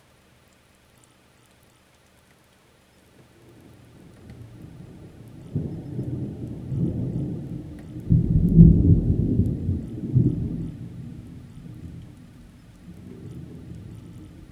gentle rain with occasion, night ambience

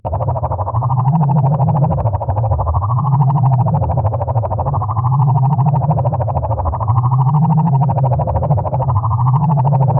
futuristic sci fi ambiance

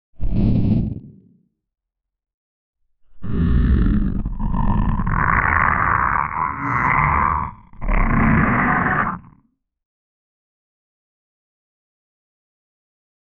grotesque monster sounds

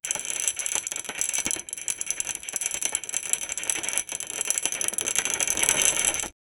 spin, cameras

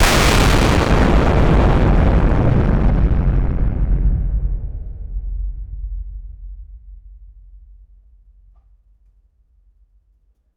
nuclear explosion; war

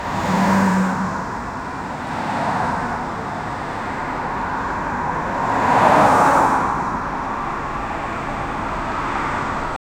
driving a car on the

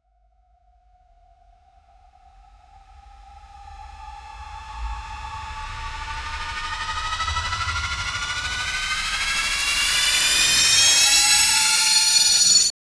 cinematic riser sound, beat drop

